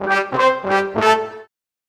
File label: Horns; Rondo Brothers; Sing Into The Machine; Sonic Foundry (Sony Creative Software)